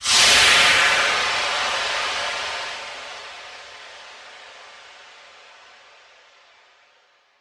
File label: steam burst